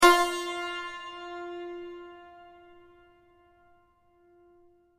santor fa

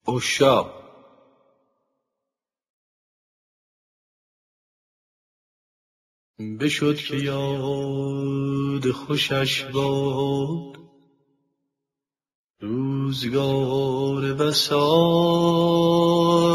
oshshagh; nava

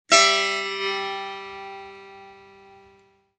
setar mi

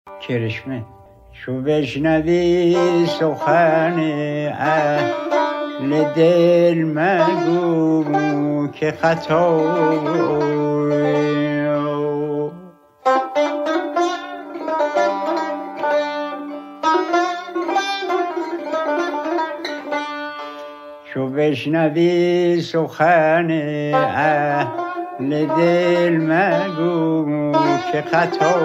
kereshemeh, shour

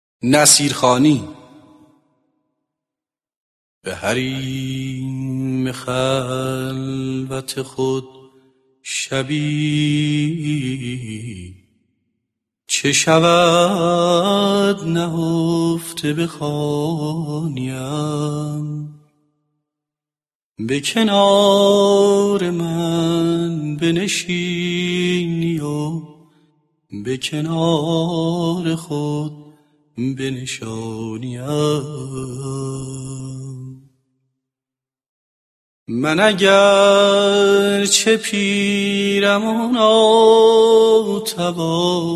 nasir khani, mahour

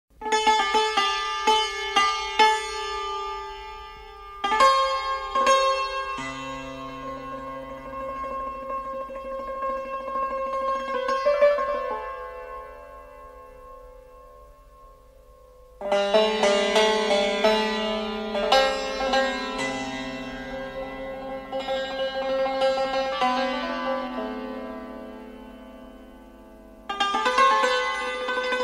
santour

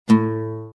oud re

